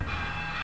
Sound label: LL CRASH; LANDER; webster